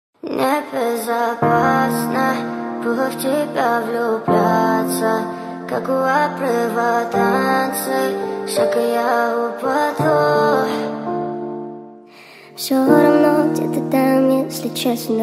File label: acoustic speed up